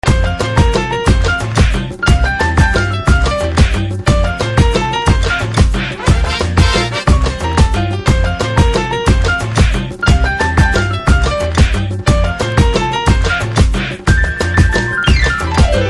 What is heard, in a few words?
BGM Piano; Maestro; Telugu